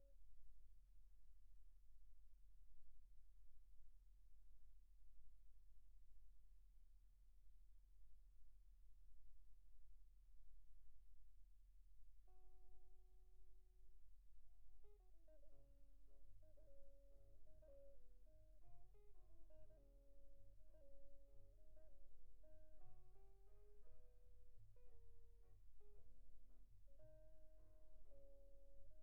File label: Sax